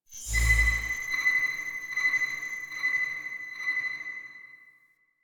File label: Amplify Spawn; Warframe; Veilbreaker; Devourer Kahl